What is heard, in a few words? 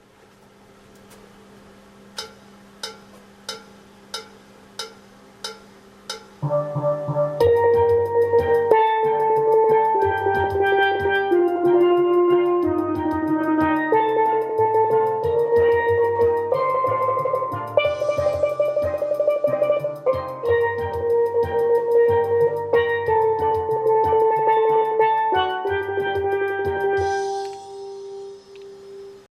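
TENOR SOLO TENORS 92, Steel Band; bella-ciao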